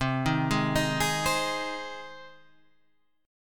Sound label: C Minor Sharp 5th C; Cm#5 Chord {8 6 6 8 9 8} arpeggiated